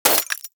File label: Bullet Impact Debris Glass; Warframe; Weapons; Bullet Impacts